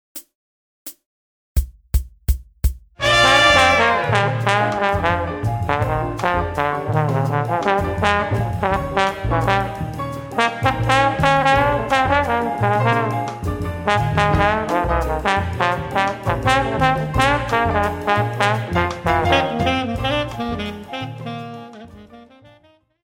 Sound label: trombone blues samba; transcribing solos